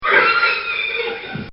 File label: Horse Scream; Animals; Bobby Cole